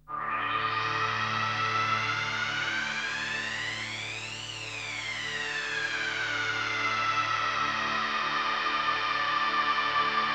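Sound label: PHAZE sweep, ambient pad; PHAZE SWP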